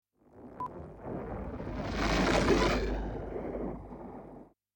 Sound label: Spaceship Whoosh